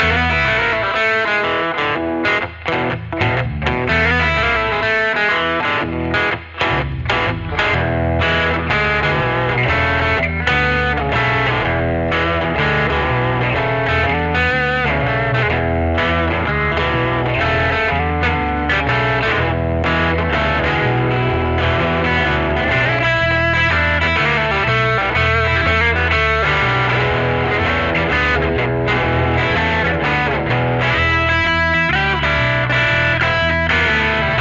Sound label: Electric Redneck, No Drums